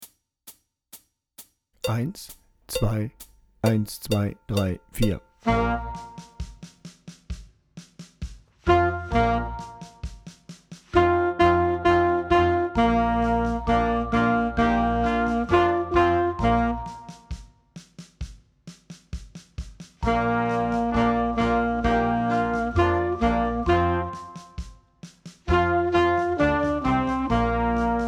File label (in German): Rock Tenorsaxophon lauter